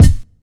good bass drum sample g key, Royality free kick drum single hit tuned to the G note